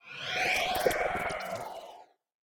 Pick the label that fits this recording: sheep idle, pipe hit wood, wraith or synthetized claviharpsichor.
wraith